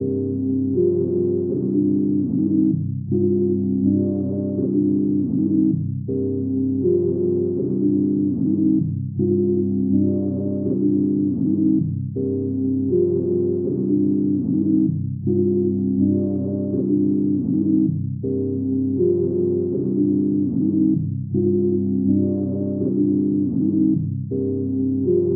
158 BPM C Min Synth Chords; Trap; Melody Loops; Loop Stems; Cymatics; Nightmares Trap Melody Loops; Street Poetry

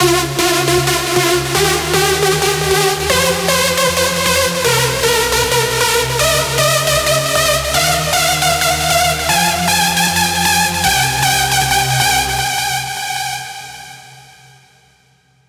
lead loop Fmin 155; Music Loops; GhostHack

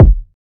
Kick; Cymatics; GHOST (Beta Pack); Drum Kit

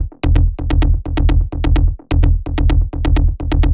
Progressive Beach Bassline E 128 BPM; Loops; Progressive Beach Bassline Folie

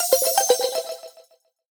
Impact FX; Video Game; Galaxy Rush; Cymatics; Video Game Sample Pack Vol 1

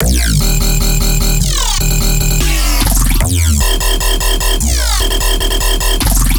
Bass Loop D# Robotalk, Dubstep, 150bpm; Ghosthack; Dubstep Sound Arsenal

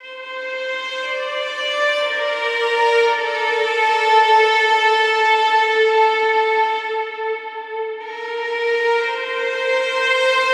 Stringed Instruments - Mid Strings - Am 60Bpm; Ghosthack; SkyRealm

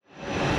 Reverse Impact; Cymatics; Orchid Sample Pack

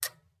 Foley; Cymatics; GHOST (Beta Pack)

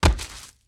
Tree Thwack Impact Low; Fairlight Sound Lib

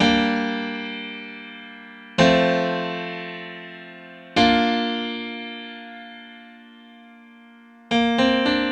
Grand Piano 110 BPM (G Minor); Stiches; Ghosthack; Free Guitar And Piano Samples

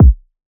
Kick - B; Drum One Shots; Cymatics; Cobra Hip Hop Sample Pack

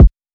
Kick - F#; Drum One Shots; Cymatics; Cobra Hip Hop Sample Pack

